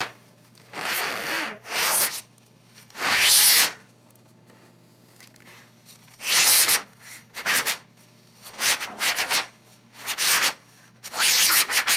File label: resonant wax paper squeals and swishes